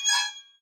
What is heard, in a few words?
Metal Squeak; A Difficult Game About Climbing